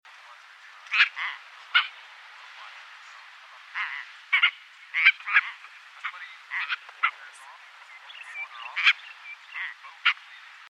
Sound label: Phaethon rubricauda, water birds; Chordata